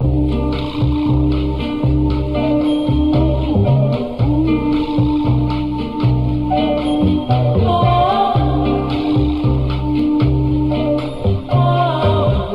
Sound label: voice funky; Loops; Phonky